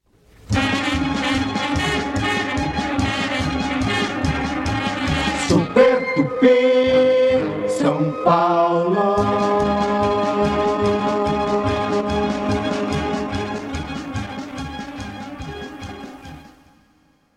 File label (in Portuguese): Vinheta; Super Radio Tupi SP